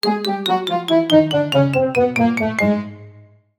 cartoon descend orchestral

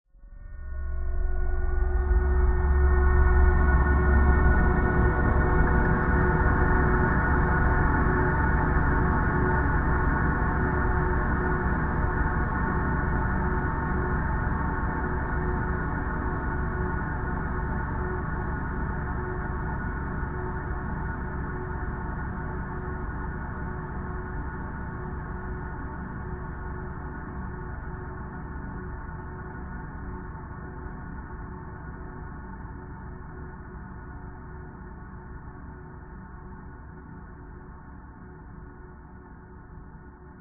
sound design drone psychopathic manic shrill dark; zapsplat

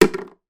toy stickle brick put in plastic tub; sound effects one

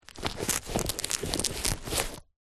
foley sneaker childs handling velcro movements; zapsplat

